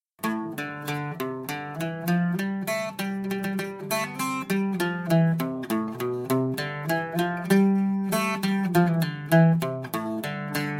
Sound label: ornementations, banjo